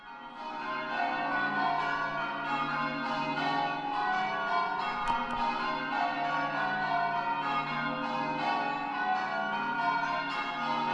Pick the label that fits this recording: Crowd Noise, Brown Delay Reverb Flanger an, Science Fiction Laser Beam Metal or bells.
bells